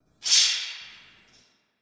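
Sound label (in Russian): Похоже на ножи коротко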